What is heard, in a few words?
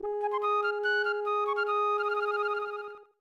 Grass Flute; Cardmaster Arcana 1992; SNES